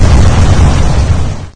river deep fast; deliantra

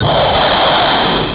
Missle; Deliantra

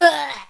human female die; deliantra

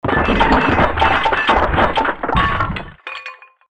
crumble; Deliantra

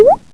drip; deliantra

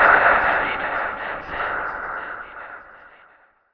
whispers hit; Deliantra

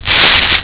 Tear; Deliantra

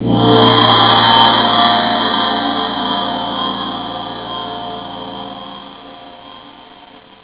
gong; Deliantra

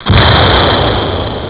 gun; deliantra

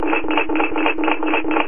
grate; deliantra

